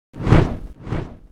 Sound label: Hair Woosh